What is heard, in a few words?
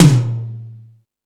Metal Drums, E-MU Pro-Cussion